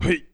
knight surprise; Politburo

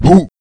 knight surprise; Politburo

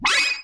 combo; Politburo

